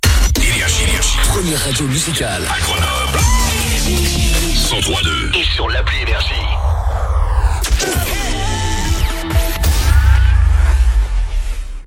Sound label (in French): JINGLE Première Radio Musicale; GRENOBLE, 103.2 FM; NRJ